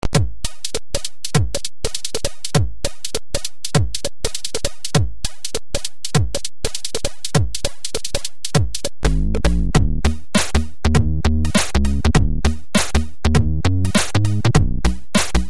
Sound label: sid 6581